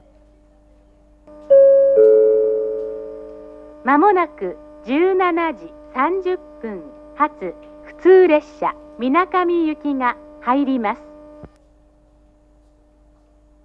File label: local, station; shiozawa; jr-east